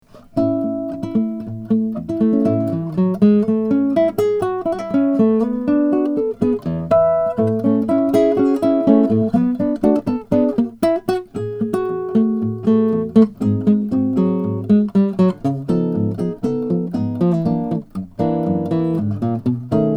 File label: 2012 kirk sand crossover brazilian; richard smith